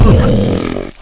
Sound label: bump; quake2; ogre